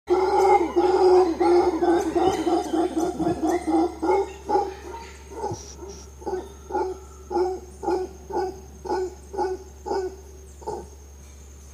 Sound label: Howler call